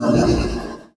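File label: Mech Fidget Vox; Sid Meier's Civilization 4 Complete; Beyond the Sword; Units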